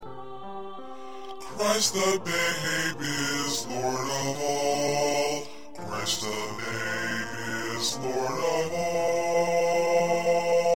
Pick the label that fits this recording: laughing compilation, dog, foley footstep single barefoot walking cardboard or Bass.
Bass